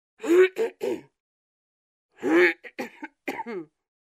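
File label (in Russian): zhenschina otkashlivaetsja, woman clearing her throat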